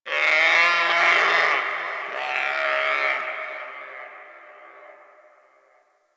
Distant Zone Two Creature; Warframe; Ambience; Eidolon Plains; One Offs

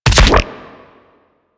Weapon Punch Shotgun; Warframe; Weapons; Shotguns

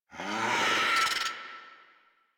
Infested Charger Run Breath; Warframe

